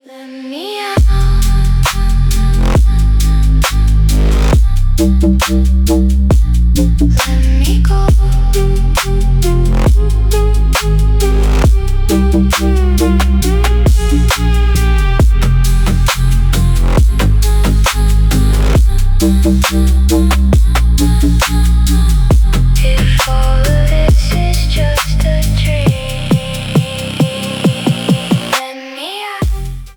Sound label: trap mafia house